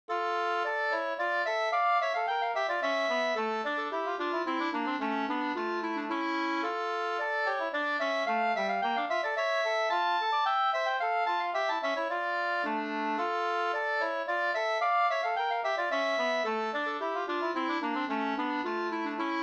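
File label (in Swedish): slangpolska, two-part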